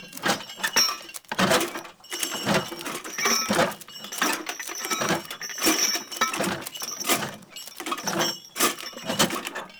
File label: King Movement Foley Loop; Characters; Enemy; Mendicant; MndKing